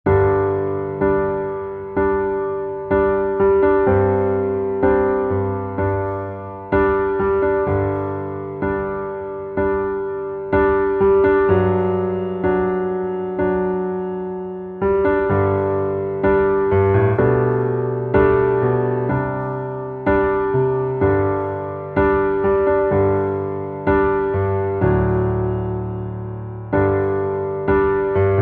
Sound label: Underscore PIANO; Worship Flow